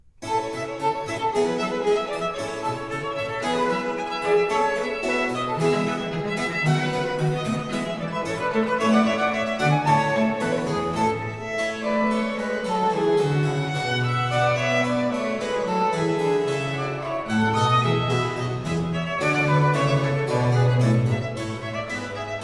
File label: Sonata a Tre in B flat Major for 2 violins continuo - Allegro; opus 1 No4; Antonio Caldara